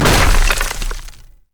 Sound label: basicattack; LoL; trundle